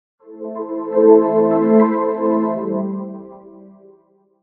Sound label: Windows XP Startup Sound